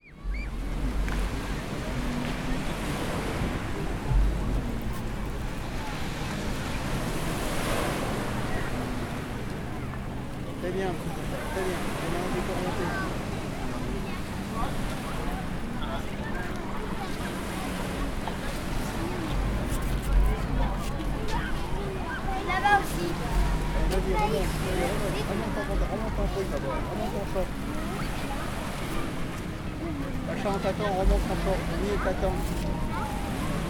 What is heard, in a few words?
Ambience Beach